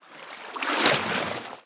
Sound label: Gondola Walk; Seer; GamigoNA